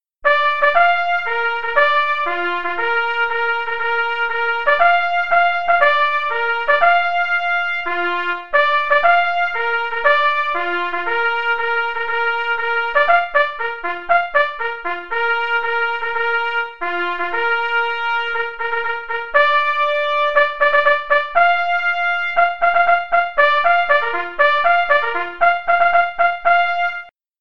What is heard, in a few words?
Bugle Call To The Colors (qn=120)